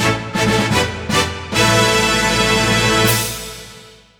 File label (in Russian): Фанф